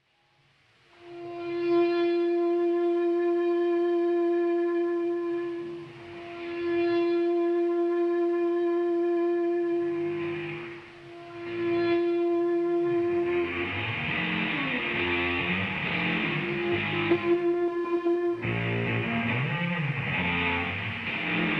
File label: Loop Solo; national art center ottawa 13 august 1979